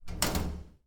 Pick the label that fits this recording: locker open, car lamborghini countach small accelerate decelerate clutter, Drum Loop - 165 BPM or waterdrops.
locker open